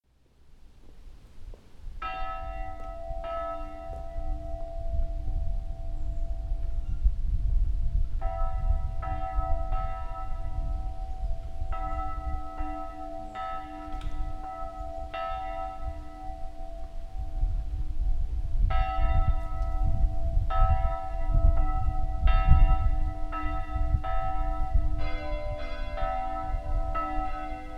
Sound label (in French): volee petites cloches; volée des petites cloches (7-4); campanologie; stpierre